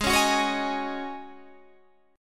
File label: Ab 7th Suspended 4th Ab